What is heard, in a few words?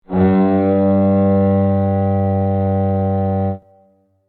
Fog horn honk blast ship